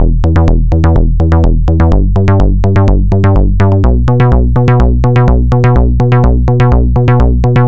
Disco Fever; LICK; Best Service ProSamples vol.48 - Disco Fever; AKAI

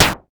Moog SD; Moog Modular55

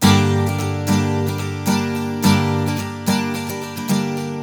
Blues Guitar Samples

